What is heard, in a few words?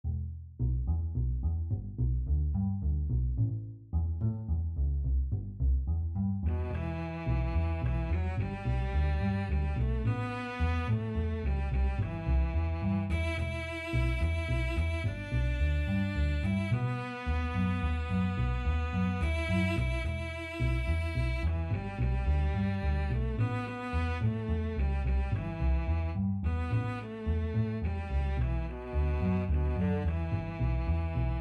cello bass duet